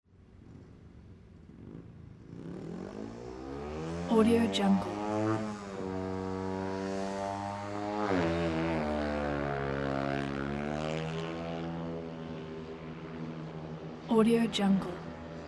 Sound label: speedway training laps fourth corner perspective; mountainaudio